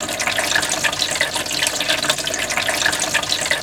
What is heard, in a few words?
water well